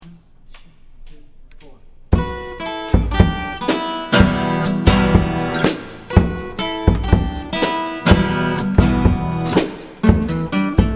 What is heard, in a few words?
Acoustic; rockbandacademy